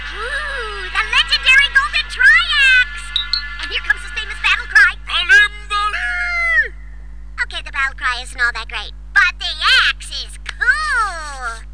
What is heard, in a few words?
battlecry